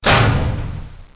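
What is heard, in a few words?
door close; tnpdemo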